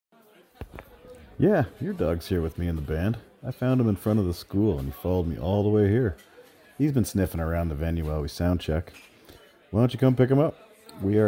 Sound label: band guy